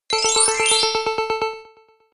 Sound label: game win